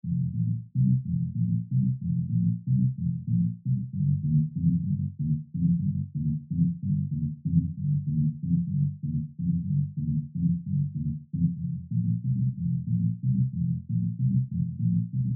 basse inversee

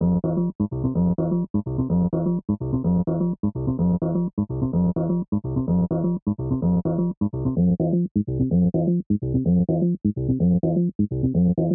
basse build up

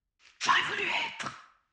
souffle

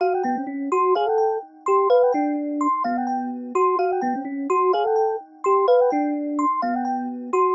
melod aigu double

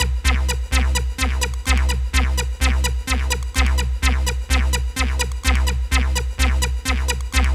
synthe acid long part

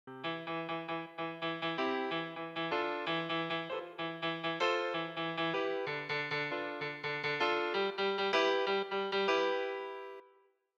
piano full temps guitare

